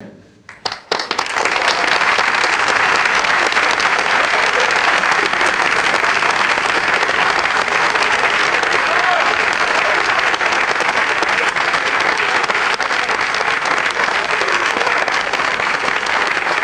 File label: small crowd clapping indoors; crowds